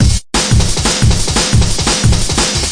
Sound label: 177 break, DnB Breaks; 141 to 185 bpm; ethex